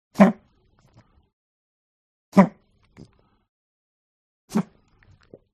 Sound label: DOG Pug Gag Smack Medium; ANIMAL; smartsound